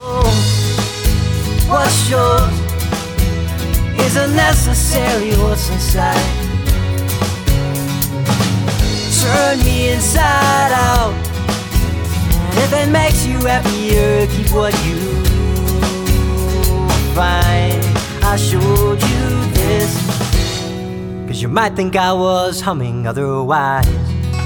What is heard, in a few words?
Humming